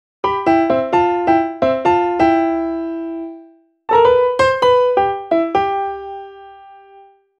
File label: Caribbean, латино